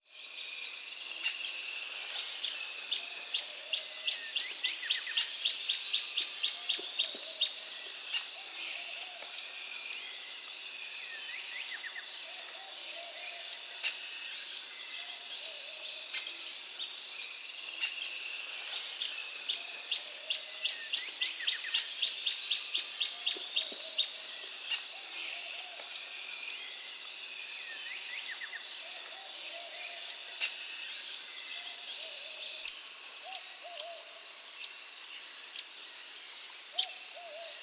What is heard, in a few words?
Montane Foliage-Gleaner (Anabacerthia Striaticollis), Andean Birds; S. America